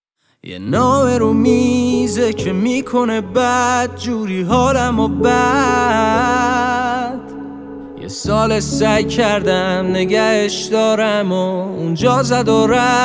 Piano Version

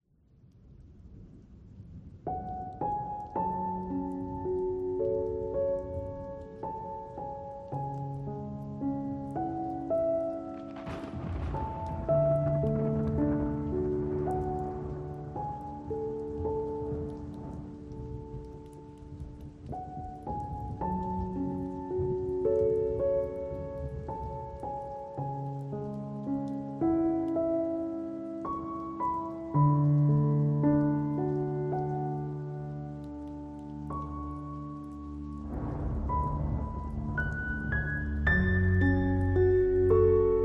Rain (A Very Sad Piano Song)

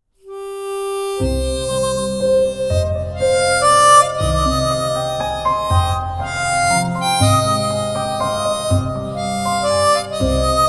Harmonica Version

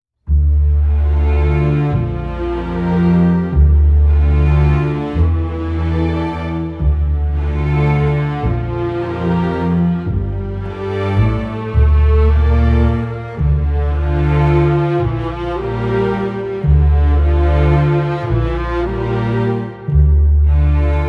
Strings Version

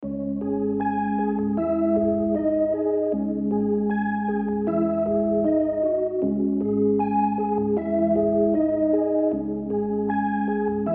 Instrumental

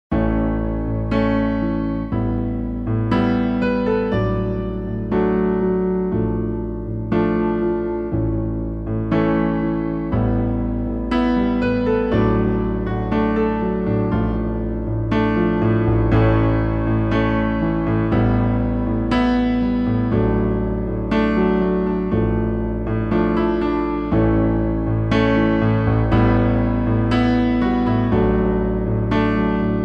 Piano Solo

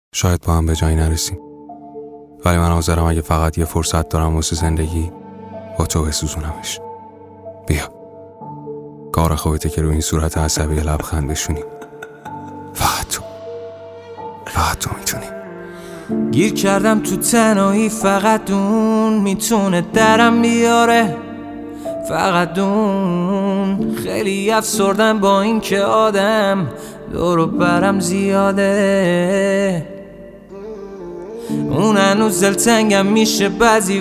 Piano Version

